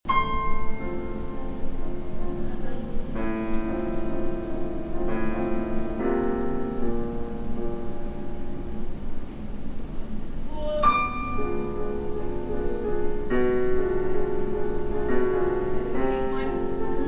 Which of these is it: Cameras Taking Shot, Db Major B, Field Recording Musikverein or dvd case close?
Field Recording Musikverein